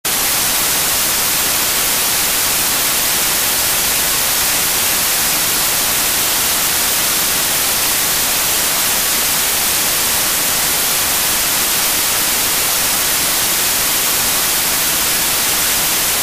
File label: white Noise